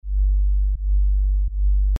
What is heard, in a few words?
ENV